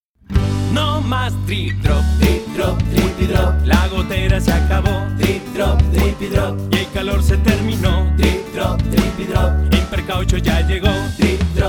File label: Jingle; Impercaucho